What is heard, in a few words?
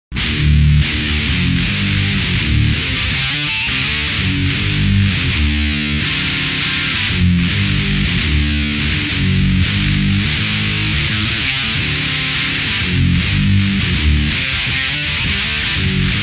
Metal Sludge, gitar